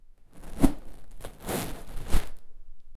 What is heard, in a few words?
puting somthing light in; acoustic